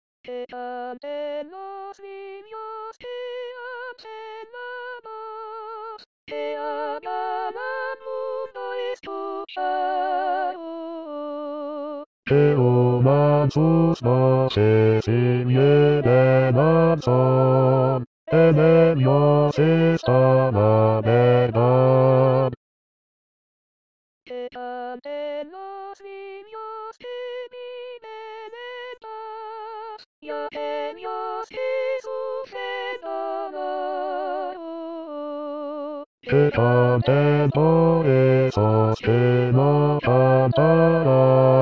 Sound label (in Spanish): 47 canteninos B voz, con voz